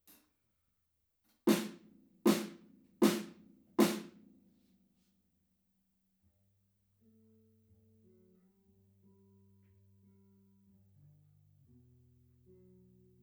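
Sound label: PZM omni stereo, drums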